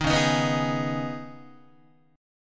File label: C sharp 7th Suspended 2nd C sharp down Guitar; C#7sus2; strummed; JGuitar